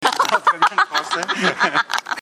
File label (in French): rire